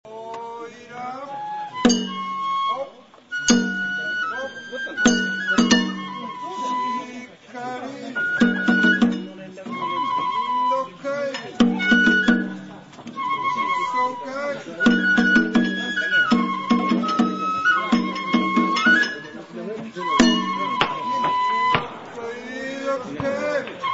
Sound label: banjo